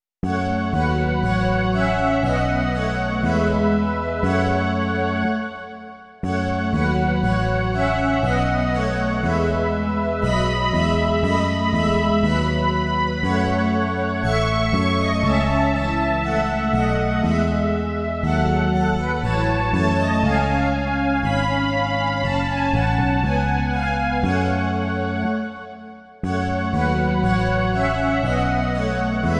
synthesizer a baskytara; Bůh náš všemohoucí; Imaginativní verze

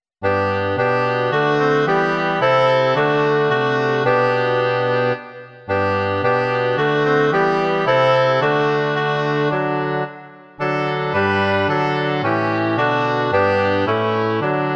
2 hoboje, fagot a tuba; Sláva Bohu budiž v nebi; Imaginativní verze

